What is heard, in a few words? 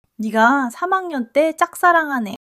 laughter